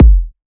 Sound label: Techno Kick; Advent Calendar - Day 6 - Techno Kit; Kick One-Shots